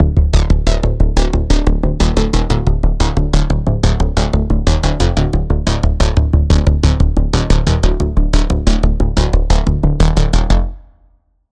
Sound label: Pulse, Spire; Labyrinth; Routed Sound; Spire Expansion; Quantum Enforcer Vol.1